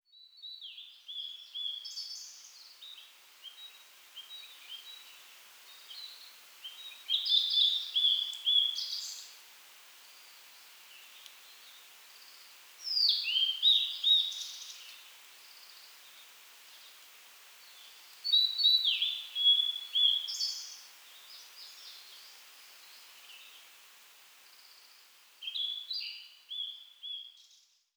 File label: bird